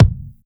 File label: 130BPM Kick; Pressure 1 Kick 2